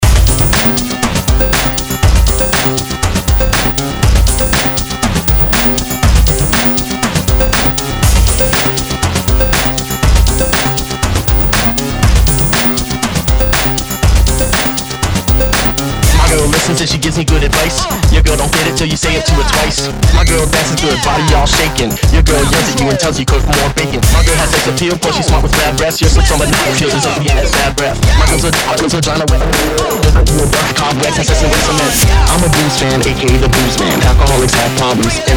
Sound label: hiphop